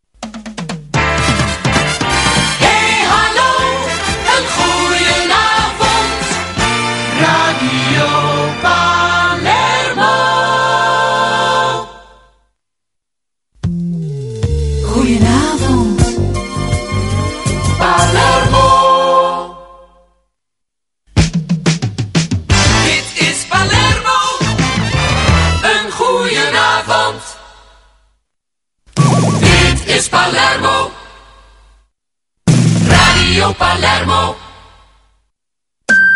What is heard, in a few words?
jingles; Palermo